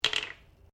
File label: Bottle Plastic Cap Plastic Place Bench; Various bottles; Plastic (Sound Effects:Materials)